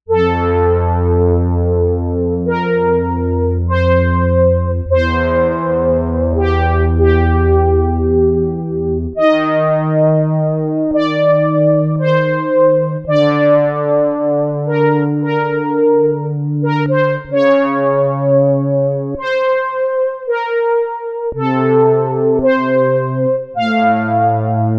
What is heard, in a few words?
Brass AN BRASS, synth